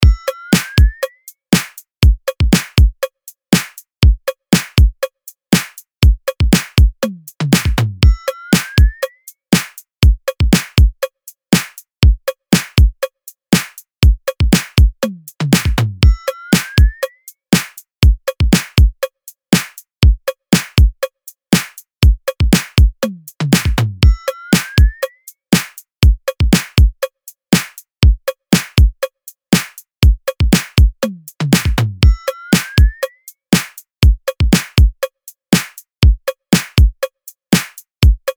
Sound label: DRUM LOOP QUIRKY POP 120BPM